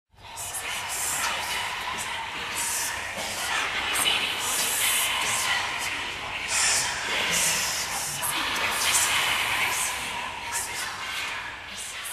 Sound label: scream; Whispers; RSVP VOICES; Audio Network